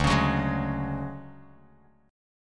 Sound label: D Major 7th Flat 5th D down Bass Standard, chord; strummed; JGuitar